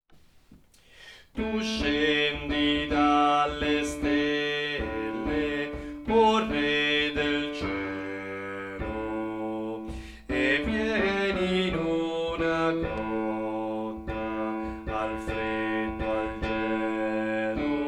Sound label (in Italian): bassi, coro; Coro Isola del Giglio; Natale